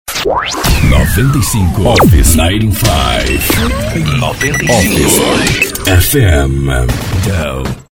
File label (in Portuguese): vinheta